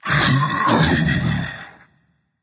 grunt lift berserker; cuchulainn

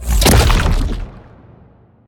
activate; Smite; god Kuzenbo v2

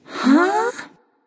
confused; smite; nox

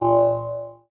landing; smite; ahpuch skin10

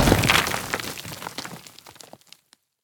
stone destroy; smite; medusa

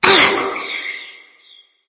grunt lift light; smite; voicepack; hel

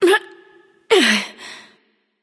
grunt lift; daji

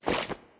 footstep plus cloth; smite; scylla

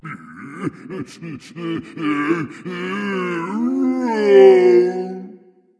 boohoo; smite; thor

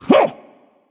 grunt attack; smite; ahpuch

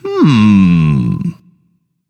thinking; danzaburou

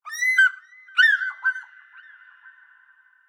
ambience good bird; env s8conquest; smite

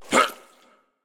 hitreact small

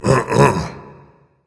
disagree berserker; cuchulainn

